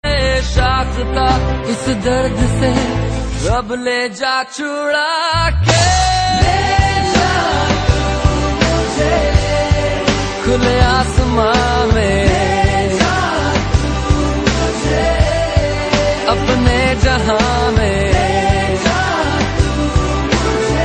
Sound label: cry tone; children